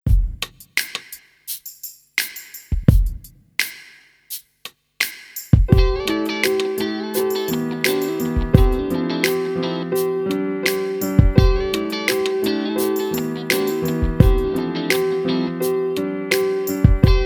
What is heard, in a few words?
chilling guitars 432 hz